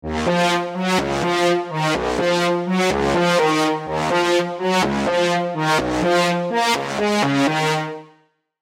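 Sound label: E flat minor 125 bpm, House & Deep House MIDI Melody; Skeleton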